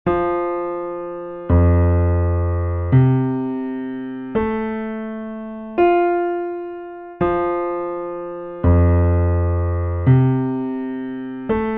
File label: impulse heel sitbone tip C5; note resonances